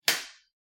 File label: binder snap